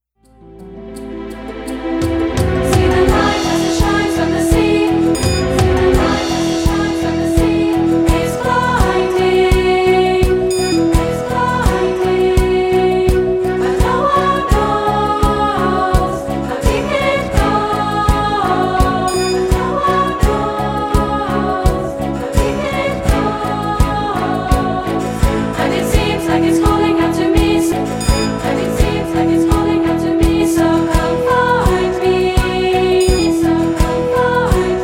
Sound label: echo track Chorus